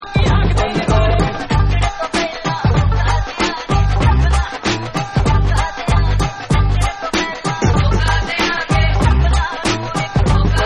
Dhol

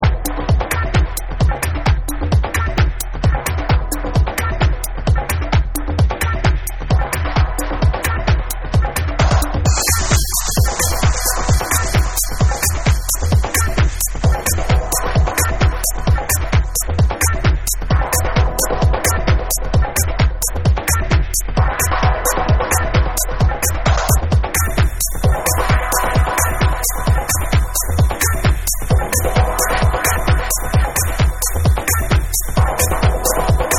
Dub

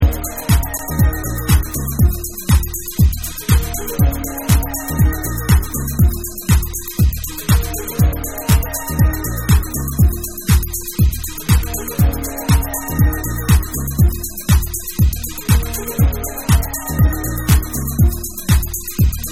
Echoes And Instruments

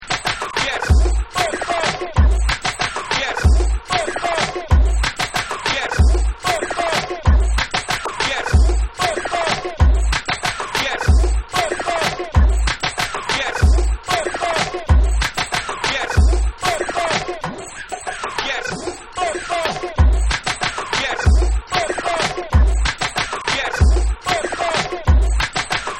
Bonus Beat

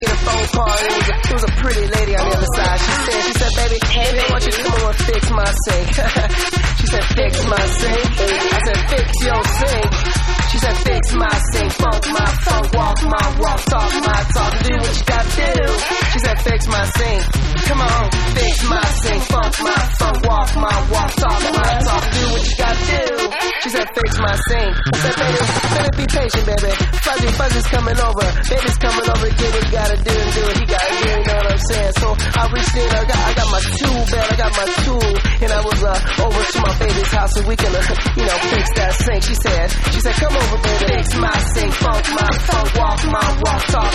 Dark Vocal Mix

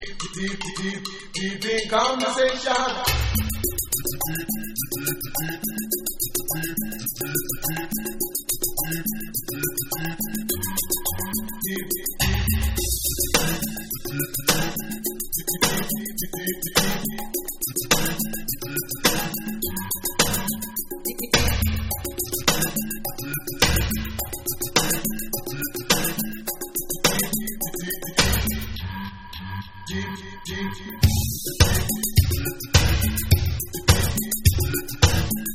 Bonus Beats

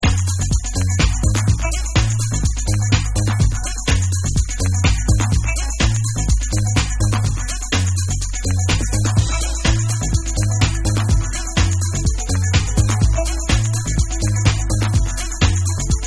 House Instrumental

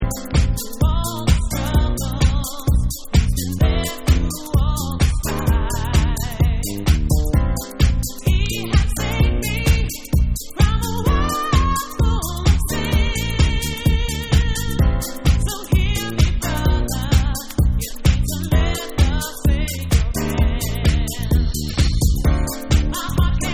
Vocal Mix

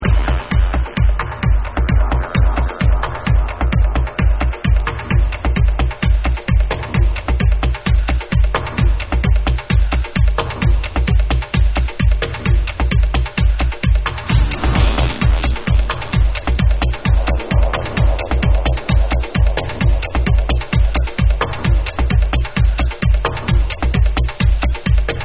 Deep Drum Mix

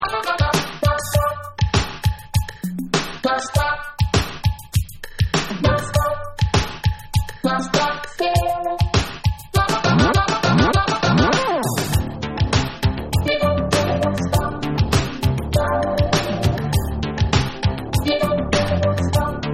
Dub Rap Beat Box Mix

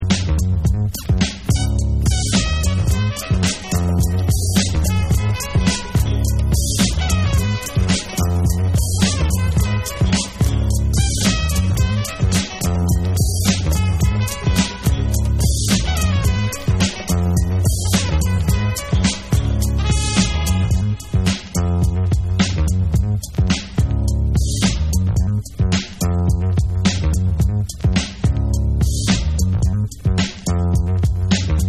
Instrumental

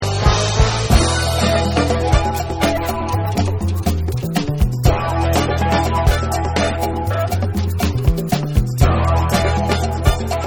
Acoustic Version

